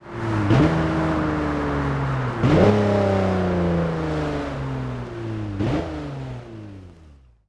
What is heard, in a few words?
throttle off, vehicles; gmod; tdmcars; rav4